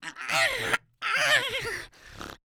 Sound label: goblin death